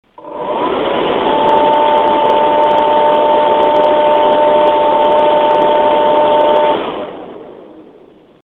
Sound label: cooler master aero high